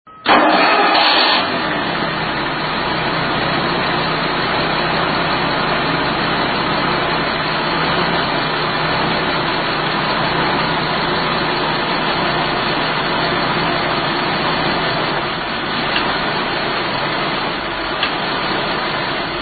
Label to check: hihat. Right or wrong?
Wrong